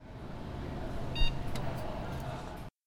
Bip

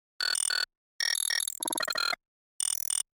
Videogame sounds

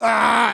Male Attack Cry

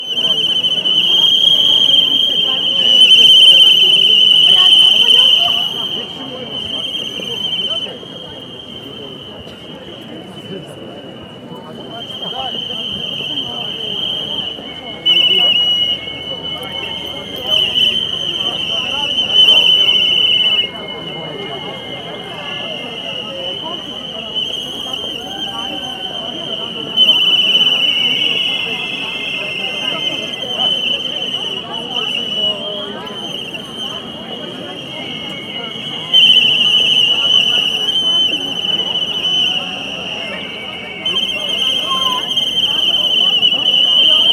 Street Rally Walla Chatter Noises Whistles

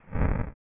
8 Bit Flame Or Lava

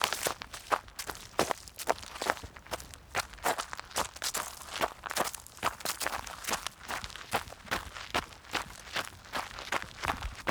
Forest Run Gravel Sticks Montage Mixed

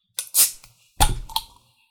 Beer Bottle Opened Escaped Gas Pressure Release

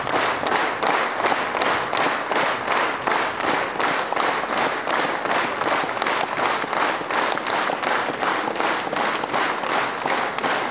Standing Ovation Applause Loop

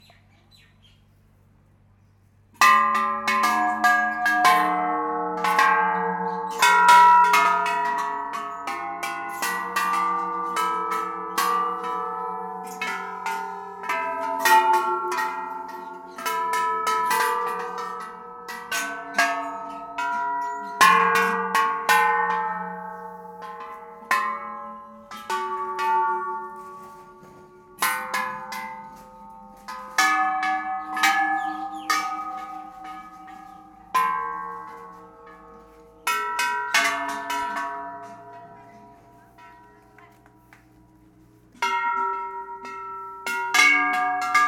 Bells With Birds

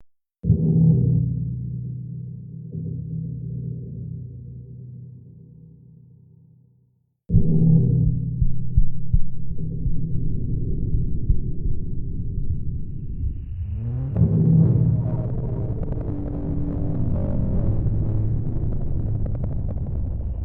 Creepy Sadness

